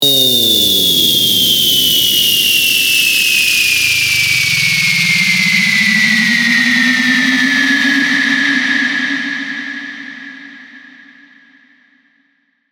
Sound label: shooting star